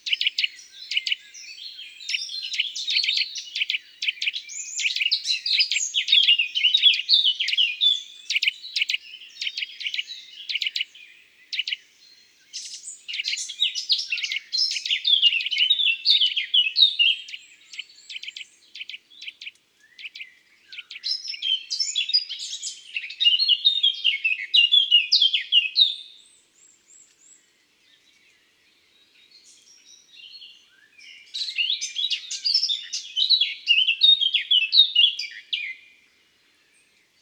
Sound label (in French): Sitelle torchepot Chants d'oiseaux dans la campagne